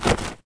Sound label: fall dirt; foley